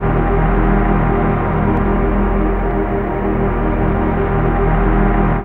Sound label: Shoes Pad, Jungle; M8